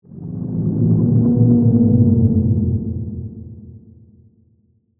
Sea Creature Moan, Horror, Under, Water, Whale, Song; Monster; BlastWave